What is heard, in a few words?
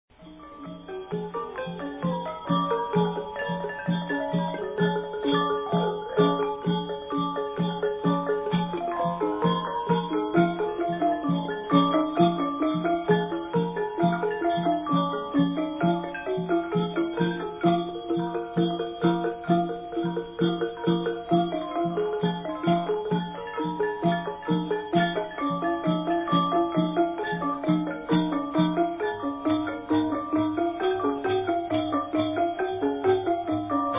Lao Instrumental Music; Khongvong, Pikeo, Khui lip, lanat thum, kong that, kong taphong, 2 khong nyai; Luang Prabang/1974